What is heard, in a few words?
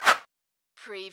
CARTOON SWISH